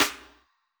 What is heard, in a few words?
hiphop snare, Effect on